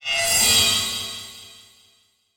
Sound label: Production Element Title Transition Crystal Element